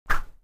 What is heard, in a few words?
whip gesture